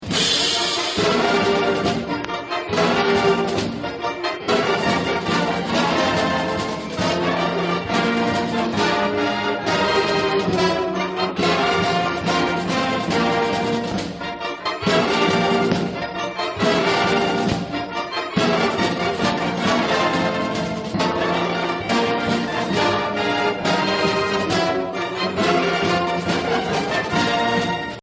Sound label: victory march